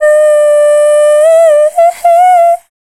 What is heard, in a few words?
Dance Vocals, FEMALE